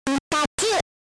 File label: Pikachu SFX; RBY